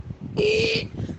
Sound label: iiiiiiih